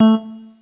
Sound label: Synth